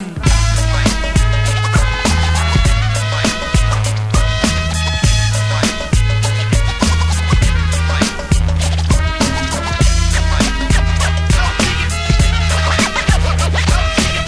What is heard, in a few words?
swarm start; cstrike; deathrun freezysounds